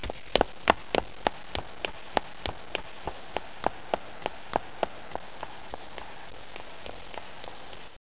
Steps

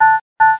blink; How Many Bugs in a Box

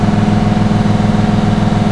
MOTOR, Slot Car

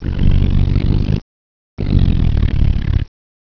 snore

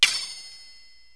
shrt twng, cartoon; Wired for Sound Pro CD; Aristosoft, Inc.

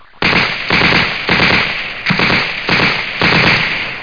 autofire

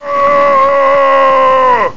fat dude yel

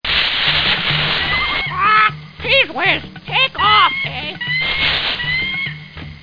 CHEEZE BIRD; T.J. Finds a Friend

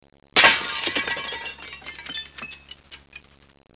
GLASS; disaster

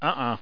UHUH

